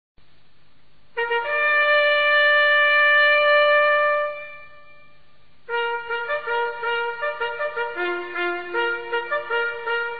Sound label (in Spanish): Diana, TOQUES DE CORNETA